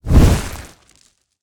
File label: Rock Throw; smite; kumbhakarna